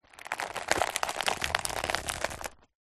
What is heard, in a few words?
impacts card box contaning fragile goods squash break crack